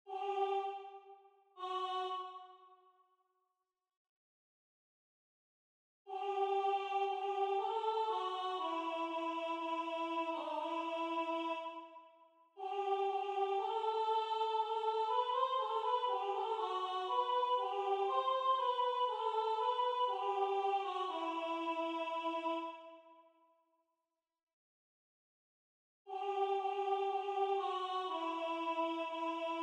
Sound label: Mezzo Soprano; Weg mit allen Schätzen; Buxtehude; Dietrich Buxtehude